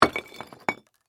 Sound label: Rock Single, Impact; BlastWave